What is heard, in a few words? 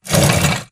Stalker Damaged Crawlscrape Concrete; Titanfall 2